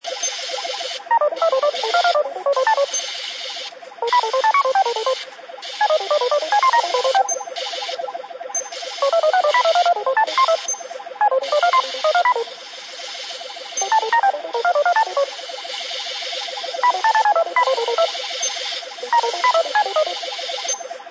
Computer Room Ambience, Ambience of a retro computer room, with computers sounds; Ambience of a retro computer room, with computers sounds, bleeps of machines and noise of old printers; Science Fiction Sound Effects; Lynne Music